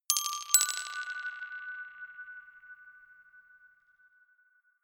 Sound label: notification glockenspiel rattle third up